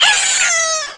Die; Peahat; zelda sounds

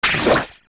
Hit; Iron Knuckle; zelda; OOT

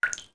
Beneath Well Droplet; zelda; OOT

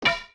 Arrow Bounce Stone; An arrow bouncing off stone (2 pitches) (Recorded)

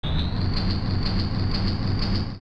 Cage Door Open; Fire Temple

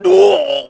Arrow Stunned; Ganondorf; Zelda

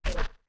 Step Land; Zora Link landing on the ground

